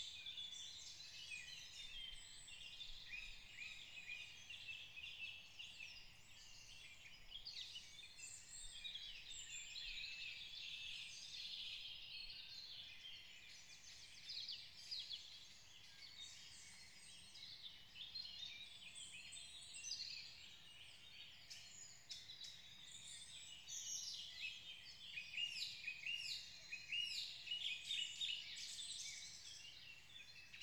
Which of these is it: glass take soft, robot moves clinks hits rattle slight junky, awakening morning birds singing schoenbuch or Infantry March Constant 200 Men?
awakening morning birds singing schoenbuch